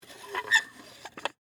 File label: Metal Hinge Open, Foley, Metal, Hinge, Open; Latches; Objects; Blast Wave